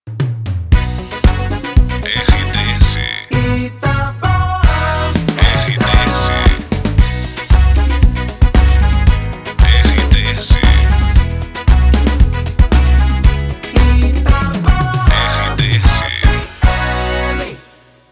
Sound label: retro retro; Itapoan